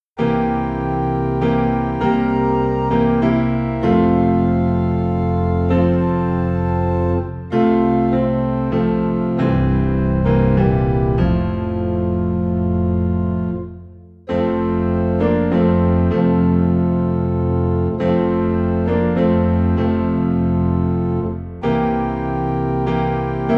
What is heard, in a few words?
Piano and Organ Low in F; Silent Night; christmas